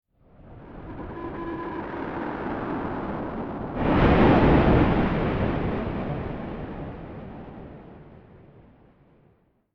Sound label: Explosion Incoming Missile Whistle Distortion Blast; Warfare SciFi; Sweetener Surreal; Explosions